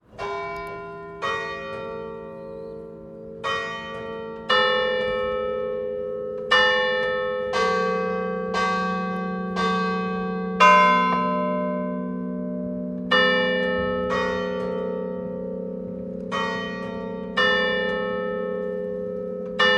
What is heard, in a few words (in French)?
eglise falaise